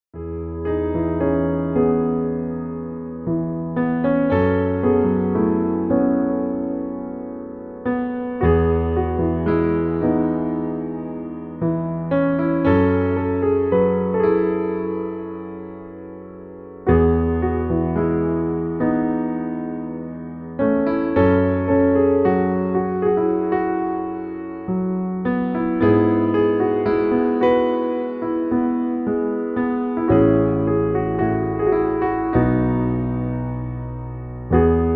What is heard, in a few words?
Seductive Jazz Session